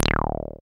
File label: SYN 303, Roland TB-303